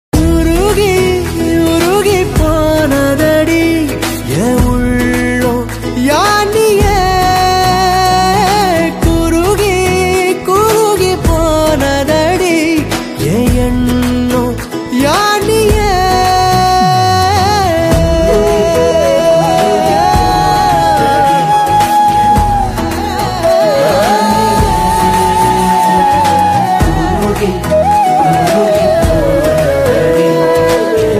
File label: tamil love ringtone song ringtone love bgm; joe movie